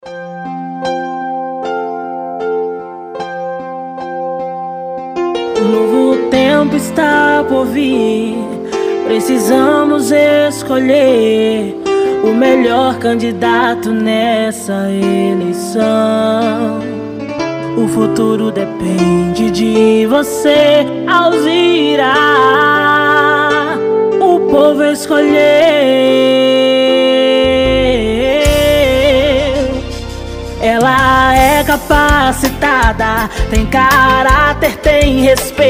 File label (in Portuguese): jingles politicos gospel forro emocionante; Jingles Politicos 2020